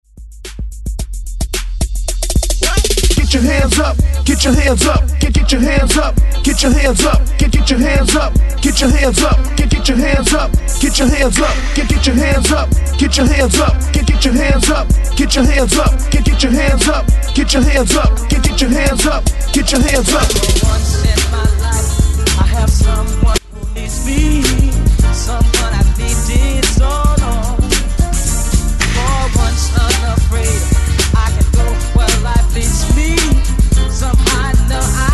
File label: REDRUM, 110 BPM